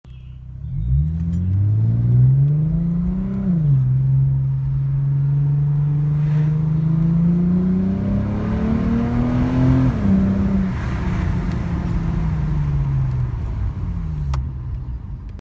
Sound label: muffler delete; rc 1st gen 2015-present